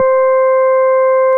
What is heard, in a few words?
RHODES; Best Service Advanced Media Trax Modern Composer